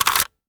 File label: mag out, weapons m16; Urban-Coalition; Decay; arccw ud